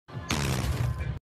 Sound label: time stop teleportation sound effect; dio